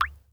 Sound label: Liquid Water Water Drop